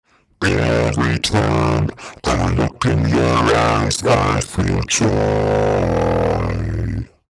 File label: Vocals Extreme FX; Antares; World Slayer